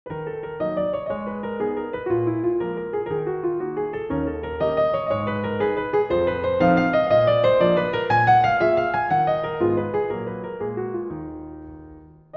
Etude in E-flat minor

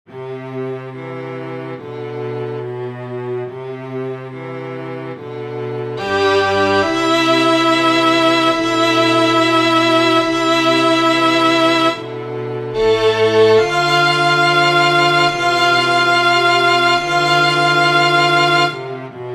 String Quartet (Incomplete)

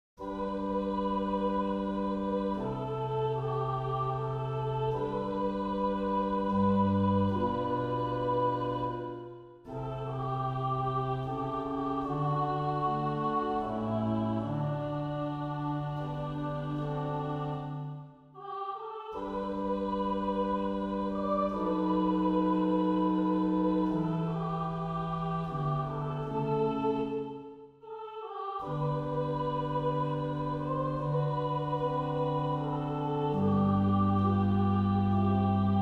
Gospel

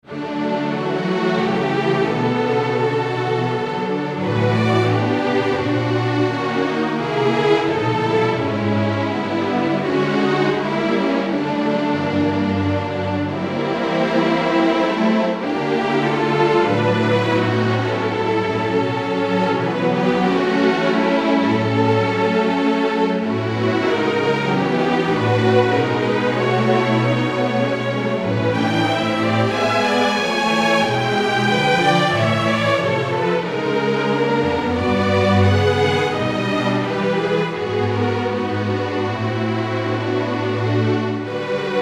For Strings

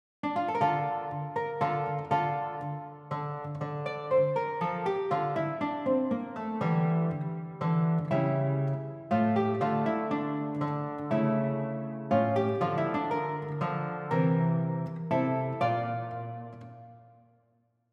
Baroque Guitar chunk

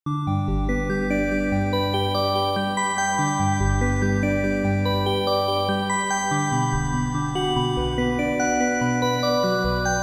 AudioKit Synth One Dream Pad improv

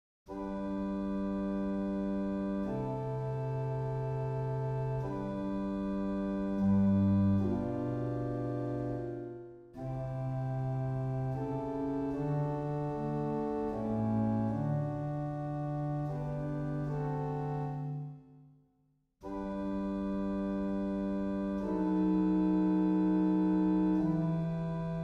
Gospel Accompaniment Only

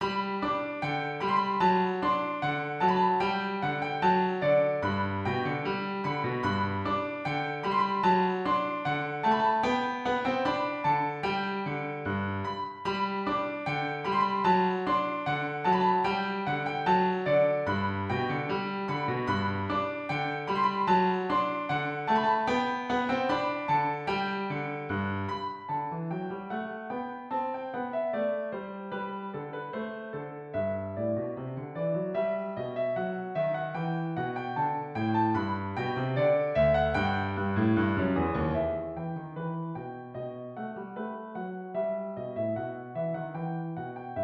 Six Morceaux Musicaux no5 in G major

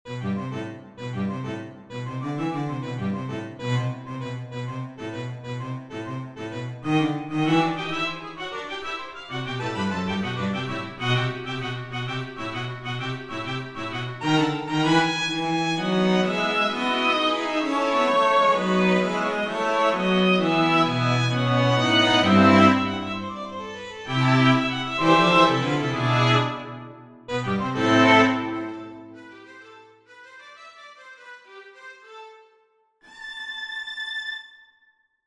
String Quartet

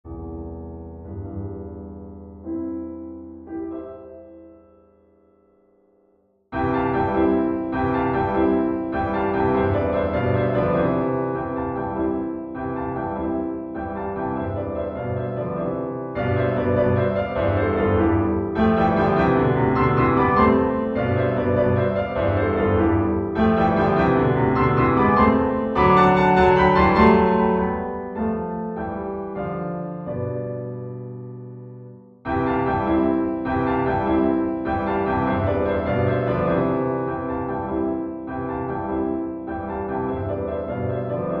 Etudes for Four Fingers No.1 in C major Thirds

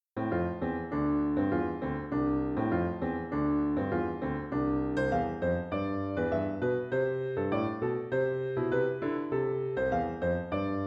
Three Sententiae for Piano

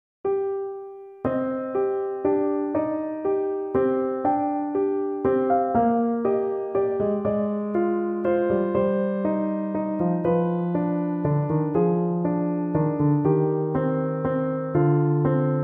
Lonely Waltz

